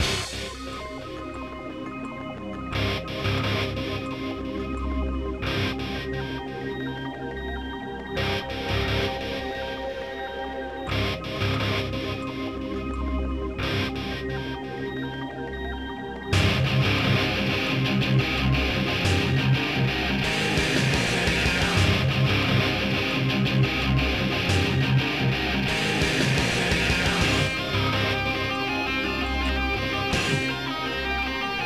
jaguar trance

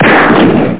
Gunshot; Angst; Amiga

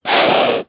gazelle attack; Immortal

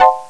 musical beep; Sound Effects for Multimedia - Volume 1

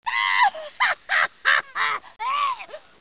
He Laughs; Bible Fun Pack; PARSONS

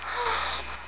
MOAN

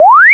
warp; Herois

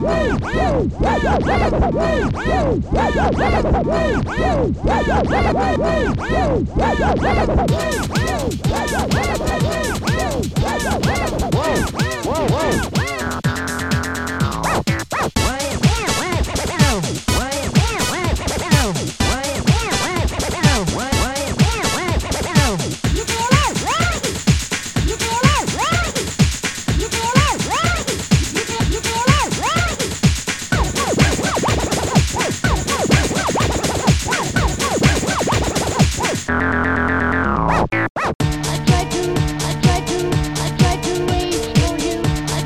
scratch it!; OctaMED SoundStudio V1; mug-members

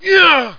oooff; quake

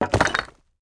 drop off bricks; Immortal

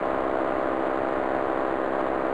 Buzz; M4 7.5.2; M4™ (PowerMac)

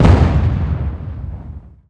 Tank Gun Light; 1944 Battle Of The Bulge

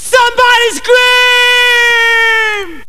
sombody scream; speech; Terra Sound Library

